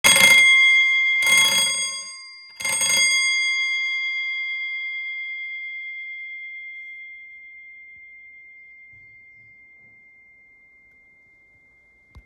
rotary bell, rotary 1888 door bell antique satin brass